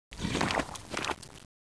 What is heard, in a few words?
swamp